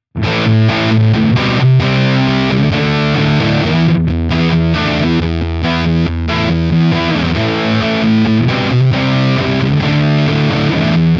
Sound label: Guitar Rig 7 Chainsaw